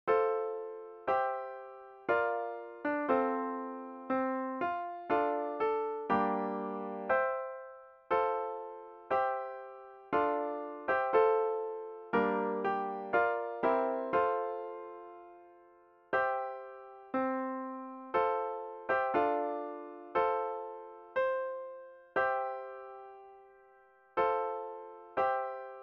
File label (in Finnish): melodia